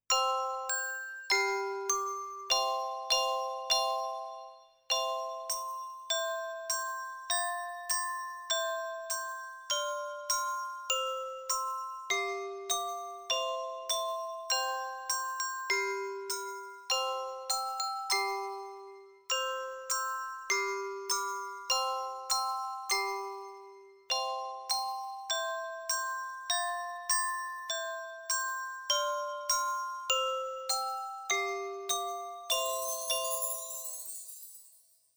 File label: instrumental; Blistaj blistaj